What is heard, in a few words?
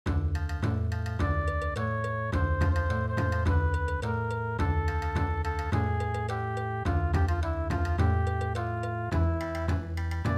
Bon Odori, midi